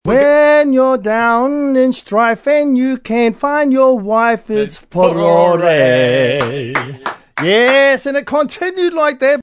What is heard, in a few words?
singing